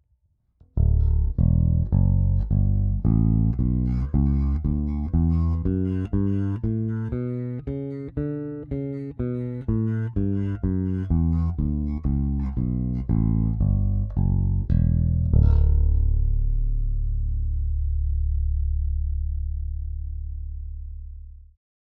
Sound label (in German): Aguilar Super Double Both PU passiv